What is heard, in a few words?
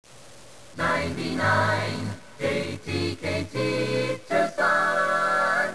jingle